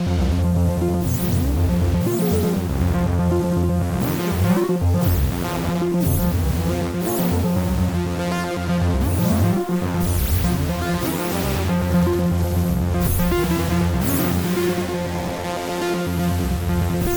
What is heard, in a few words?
Erica Synths Black Hole DSP2 Synth Delay delay time feedback CV; Hellraiser